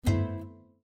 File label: battery critical